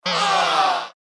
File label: roulette